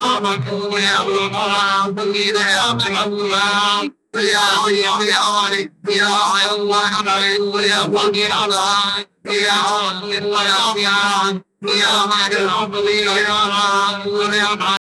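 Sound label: futuristic trippy warped, robotic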